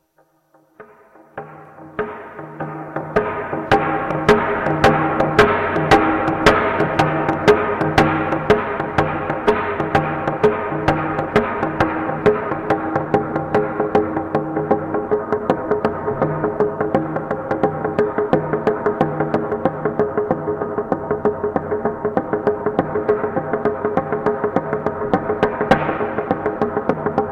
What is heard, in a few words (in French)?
instruments; simandre